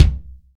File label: present bass drum sound b key, kick drum sample tuned to the B note